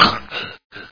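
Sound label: shortness of breath, muffled, gurgling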